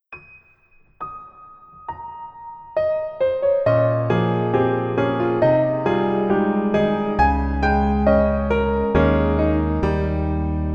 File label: Piano